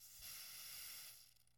attack spray; angband

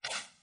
miss arrow; angband

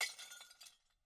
break glass; angband

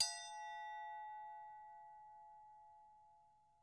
bell bowl; angband

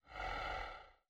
attack breath; angband

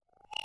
frog trill; angband

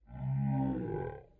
dino yawn; angband

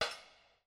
bang ceramic; angband

